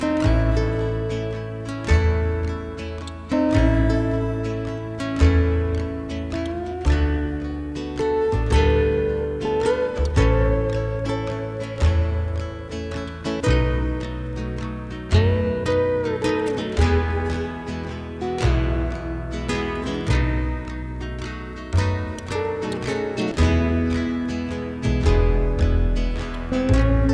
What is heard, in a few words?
shell mus